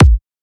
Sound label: Kick